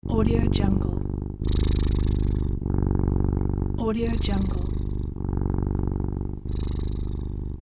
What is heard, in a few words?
cat purring